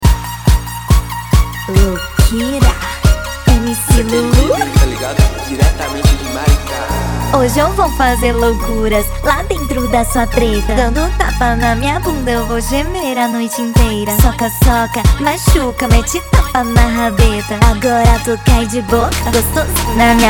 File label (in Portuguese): automotivo